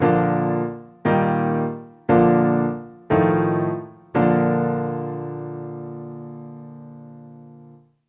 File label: b flat major primary chords